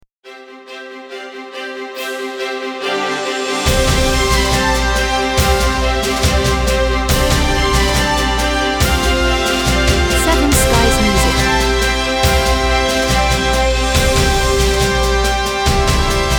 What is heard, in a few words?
Majestic Logo Ident